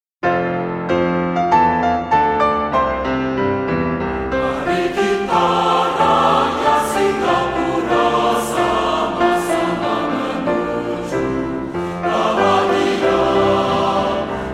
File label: choir piano, anthem; majulah singapura; national symbols